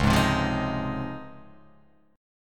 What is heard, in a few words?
C sharp Major 9th C sharp; x,4,6,5,5,3,5,x